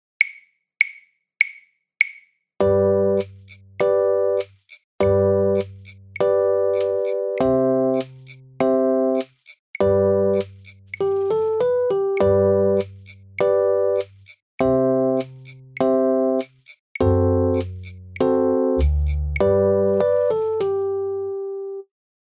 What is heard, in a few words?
G Tune Backing Track